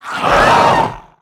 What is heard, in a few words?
Felhound Death